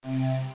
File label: rotate